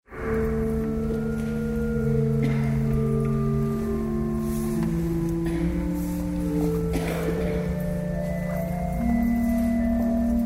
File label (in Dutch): Orgel Omhaling